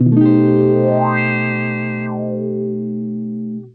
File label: wah chord b7fl9, Guitars